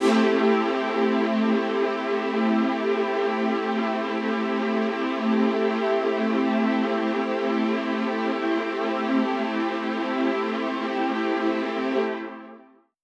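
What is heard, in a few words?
Poly String A minor, synth orchestra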